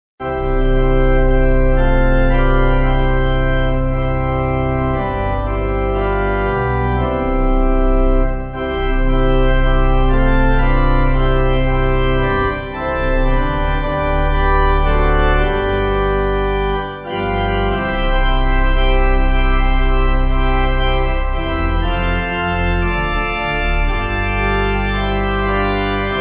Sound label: My Maker And My King Pipe, Pipe Organ; 6.6.8.6